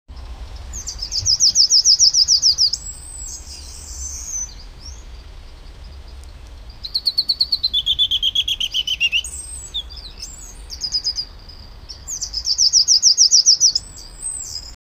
spring singing of starlin

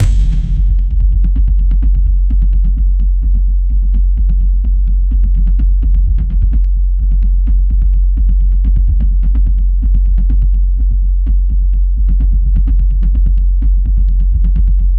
epic cinematic drum beats; bass

